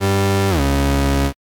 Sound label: disqualified; RS Games Sounds